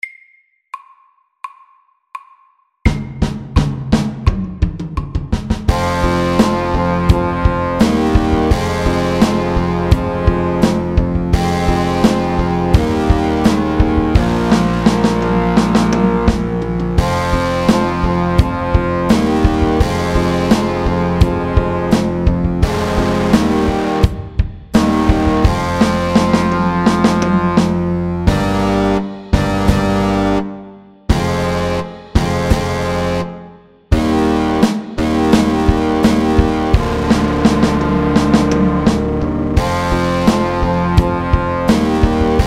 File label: Heavy metal SLOW 85